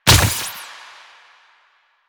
Grenade Bounce; Enemies; Soldiers; Weapons; Warframe; Lasrian; Goonadier

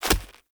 Grunt Foley Kick Impact; Weapons; Enemy

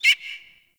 Forest Open Glade Bird Far, Day; Ambience

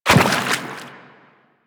Step Water, footsteps; Warframe; Teshin

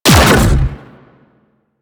Electric Tether Shot; Railjack

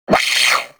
Sentient Pain, Barks; Warframe; Sentients; Brachiolyst

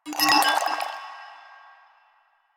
Vial Foley

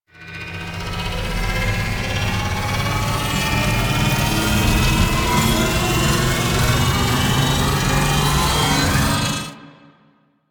Railjack Attractor Pull; Warframe; DE; Vehicles; RailJackPowers

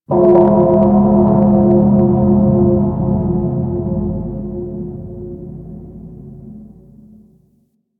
Primordial Bell, Ambience

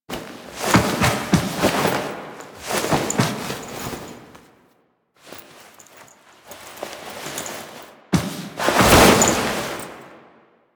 Warp Gate Start Foley

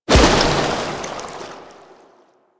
Tidal Wave End; Warframe; Warframes; Pirate

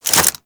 Equip, Weapons; Warframe; Furax

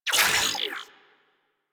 Ship Advert Hologram Off; Ambience; Props; Warframe; Corpus Ship Remaster

